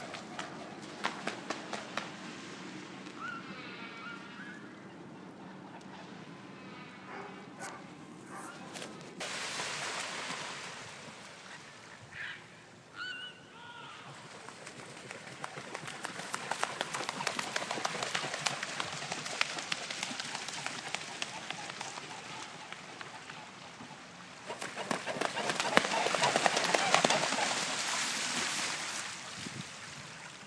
swans taking off landing and running on water on the river; river lea in haringey and a train going